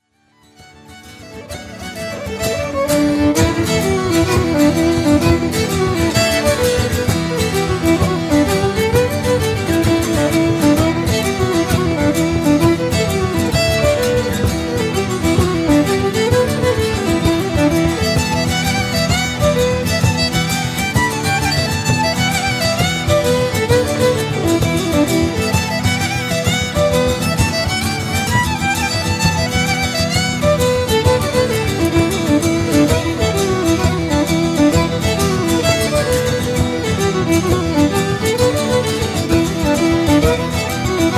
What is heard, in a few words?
Irish Band; Chicago